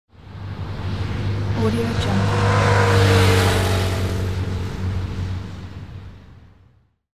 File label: motorcycle passing by